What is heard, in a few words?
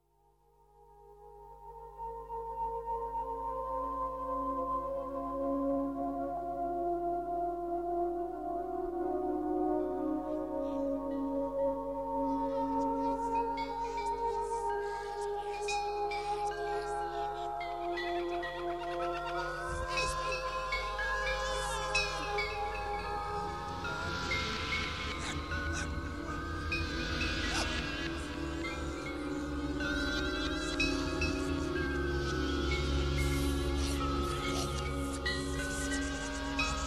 Awkward Chimes; OGRE